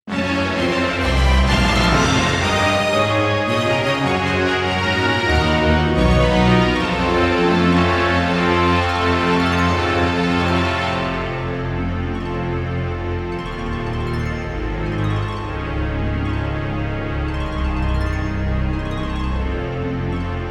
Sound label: Fanfare; Vagrant Story